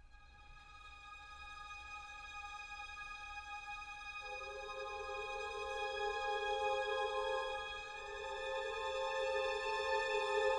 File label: Wolves